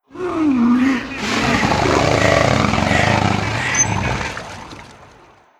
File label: alien nature; Stellaris